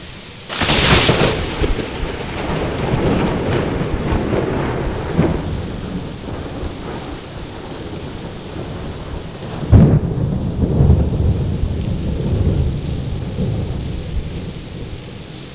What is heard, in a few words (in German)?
blitz mittel